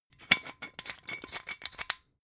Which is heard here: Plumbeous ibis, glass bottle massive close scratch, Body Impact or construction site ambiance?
glass bottle massive close scratch